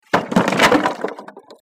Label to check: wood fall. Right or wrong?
Right